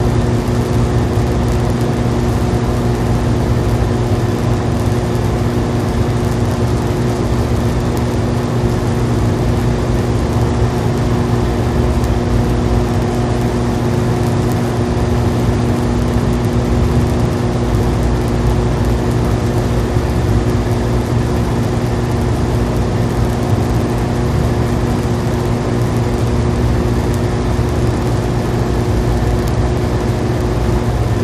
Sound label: Power Plant Transfo, buzz & hums